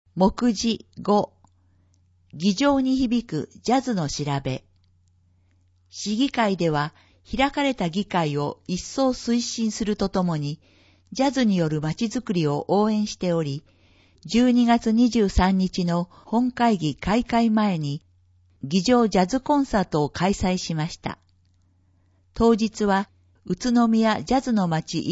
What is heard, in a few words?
jazz